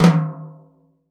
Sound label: FLAM, 50S JAZZ; Northstar - New Gold VOL-1